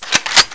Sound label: charge, weapons, mg42; nzp